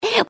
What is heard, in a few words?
gasp; pony; Twilight Sparkle